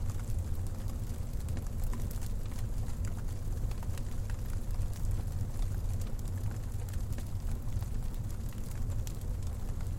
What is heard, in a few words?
fireplace; pond5